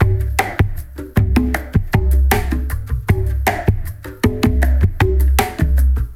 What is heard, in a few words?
ICE BRUSH REMIX, Liquid Grooves